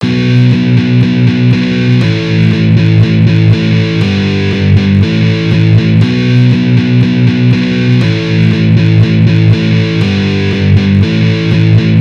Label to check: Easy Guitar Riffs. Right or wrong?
Right